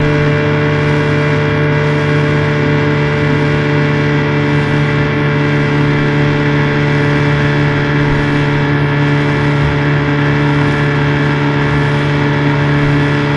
Motorboat Driving St

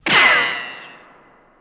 Bullet Ricochet Ban, riccos

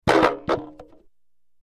Wood Shield Falls Woo, shields

